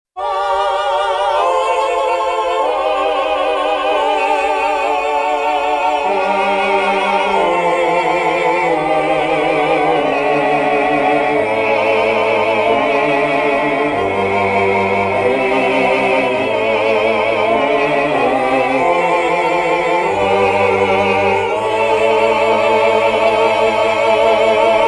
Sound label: Male Voice